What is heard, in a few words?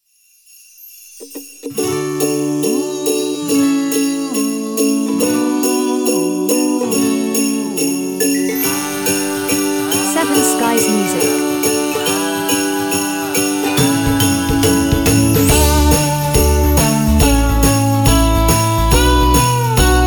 Christmas Magic Ukulele